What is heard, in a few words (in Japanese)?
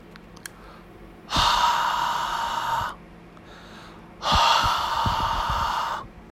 声帯が開いている状態